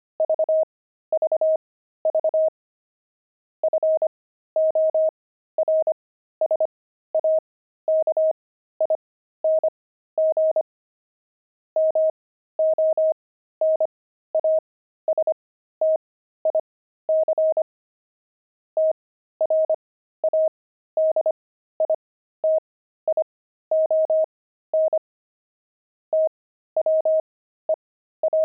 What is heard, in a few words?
pangram, intermediate pangrams files